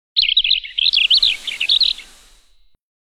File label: Golf clock alert; Nokia Ringtones; Audio themes